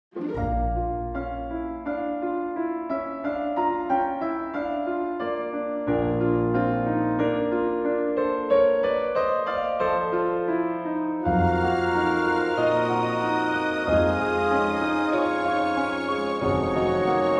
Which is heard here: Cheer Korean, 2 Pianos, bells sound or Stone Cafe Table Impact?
2 Pianos